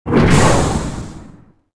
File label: ramp stop; movers platforms